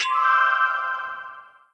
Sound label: spin button